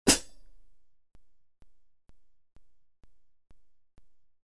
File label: 13in HiHats, cymbal sound